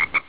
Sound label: frog